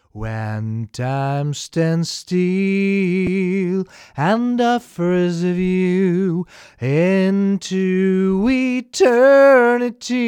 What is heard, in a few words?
Vocals